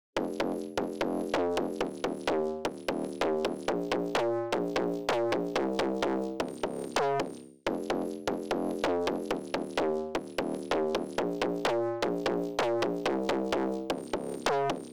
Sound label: 128 BPM F Min Bass; House; Drop Loops; Loop Stems; Cymatics; Sydney